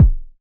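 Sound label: kick, Roland MKS-80 Drums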